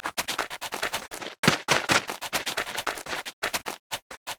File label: emote dance loop; LoL; jayce